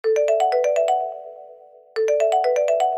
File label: Phone Ring